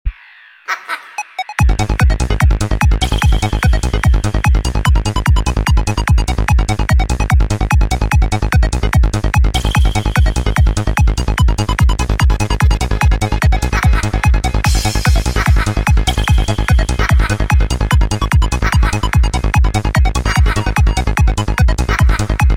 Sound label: rock n trance mix